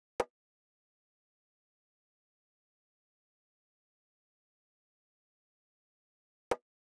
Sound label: Drum Loop 152 BPM Percussion; Cymatics; Vibes II Drum Loop Collection; For You Drum Loop